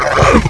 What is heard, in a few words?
damage; atros